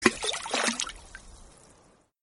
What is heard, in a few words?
flop spit sound; Arc of Templar